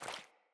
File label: Rat Guide Water Step, Foley